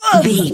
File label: voice female hurt pain low